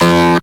Synth Voc; Vengeance Samples; VEE Synth Shots

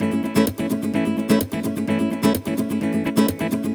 Nylon Guitar A maj, 128BPM; Vengeance Samples; VEH3 Nylon Guitar Kit 1

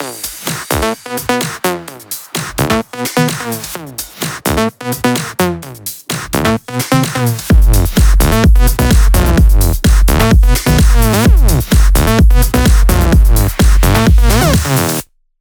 128BPM Mix; Vengeance Samples; Capone Kit

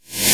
Sweeps Short

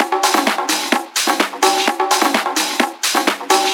Percussive Loops 128BPM; Vengeance Samples

